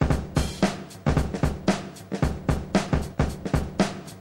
funky break

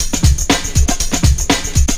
NRG Break, Trance, Drums, Breaks; Sonic Foundry (Sony Creative Software); Trance NRG VOL-1

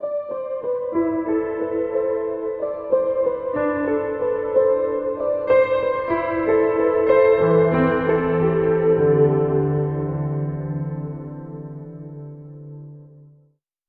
Piano FX; Sonic Foundry (Sony Creative Software); Slow Silhouette

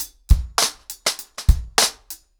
100BPM, ACOUSTIC DRUMS; The Roots Drumloops

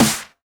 Snares; Vengeance - Essential Clubsounds Vol.4

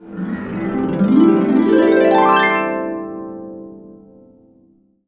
FANCY GLISS, CASCADE UP, Complete Harps; USB Soundscan vol.62; AKAI

